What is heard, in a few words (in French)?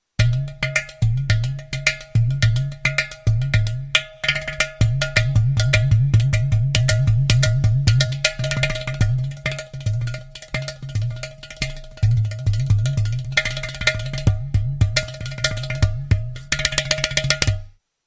instruments ghatam